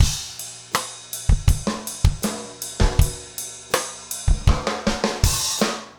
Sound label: trip hop rims